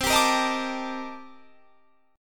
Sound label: C-Minor 13th-C-down, guitar; strummed; JGuitar